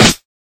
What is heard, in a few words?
short reverb snare drum sound e key; Duration 0:01; Channels Mono